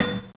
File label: iron hit; CRC2005; CRC 2005 Demo